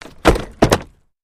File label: Carriage Footplates